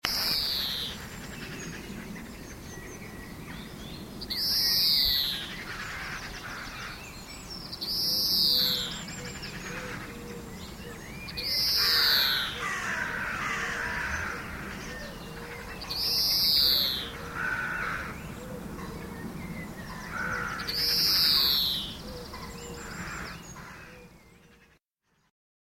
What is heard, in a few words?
greenfinch crows